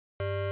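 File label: Low Button Beep Short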